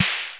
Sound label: Roland long; Drums